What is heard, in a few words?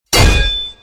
Candy crowbar Hit; criminality